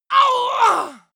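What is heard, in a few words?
female combat hit hard, screams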